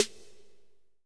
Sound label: snare